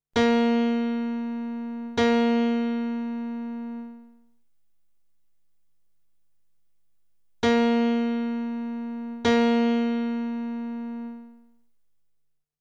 Intervalle Prime

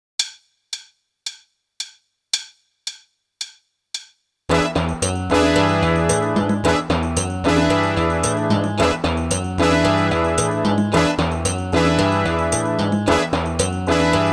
Snare Bass Drum Kombinationen; Meespeel CD

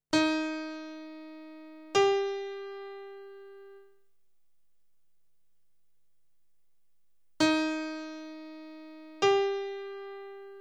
Intervalle Terz

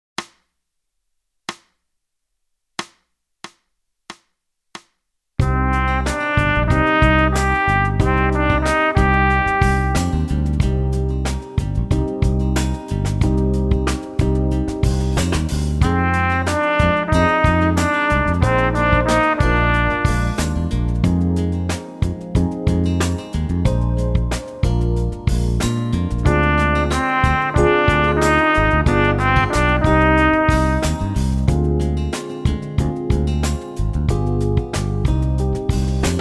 Echo Ubung

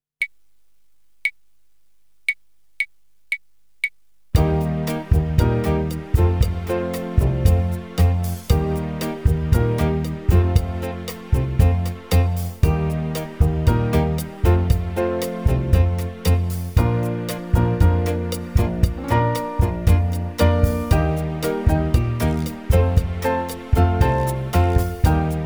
Akkordschema

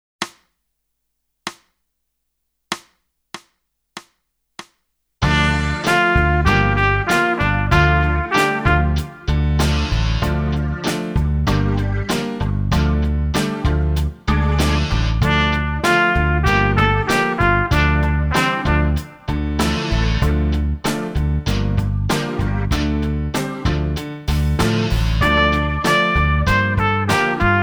Echo Ubung

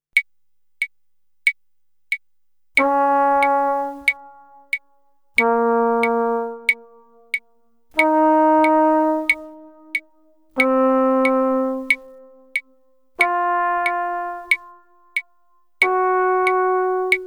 Echo Ubung

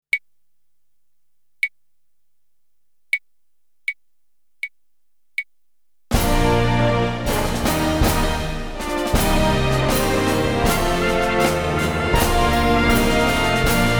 Dur Moll

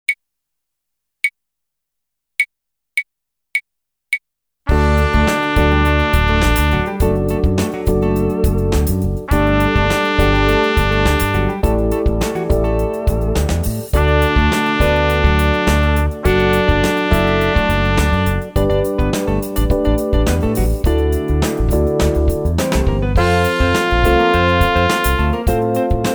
Neue Töne

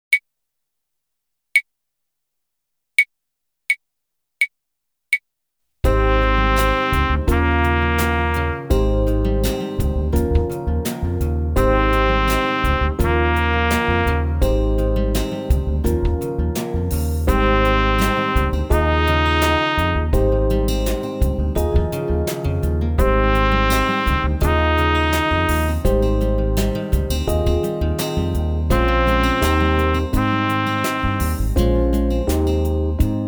Echo Ubung

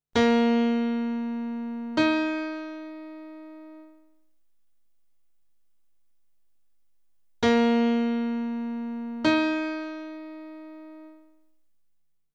Intervalle Quarte

